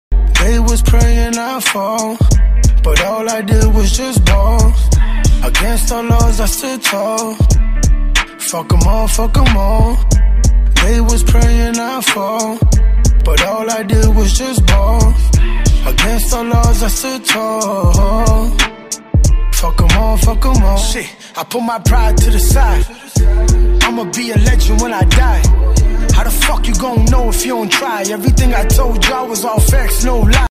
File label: Punjabi ringtone bgm